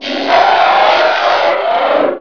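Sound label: dragon attack; eqclassic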